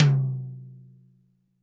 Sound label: TD-20 Tom A, Roland TD-20 Toms